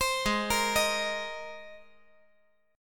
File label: G sharp Major Add 9th G sharp